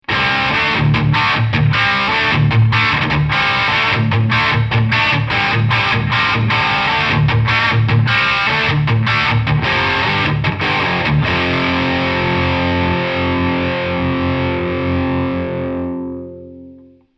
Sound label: Amp 1 Channel A Boost